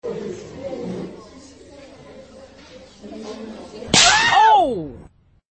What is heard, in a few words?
getting beaten meme; میم کتک خوردن